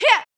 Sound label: combo, onehand sword; warrior